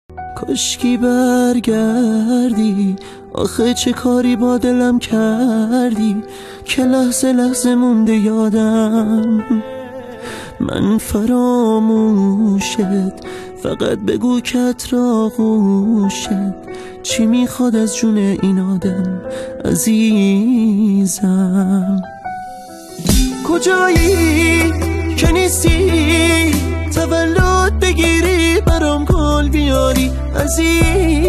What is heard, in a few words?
music happy birthday